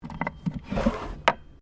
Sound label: Drawer Cabinet Wooden Small Open; Various domestic drawers; Household